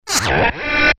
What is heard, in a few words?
Transreverse Pellet; Best Of N2O Library; Breakers & Transitioners